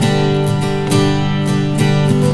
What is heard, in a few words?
Strummed, Acoustic Guitars; ACID MUSIC